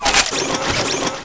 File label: cart foley, weapons